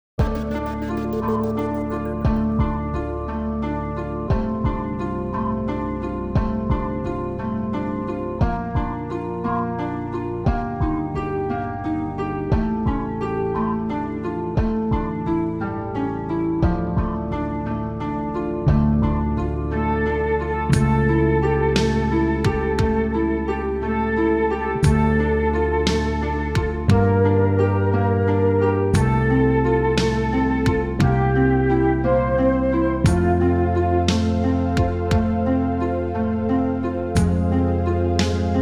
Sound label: moonlight sonata plus brass; klassik